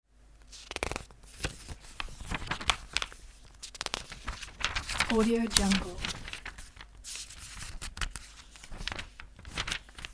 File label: page turning